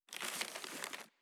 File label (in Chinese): 书店内 递交报纸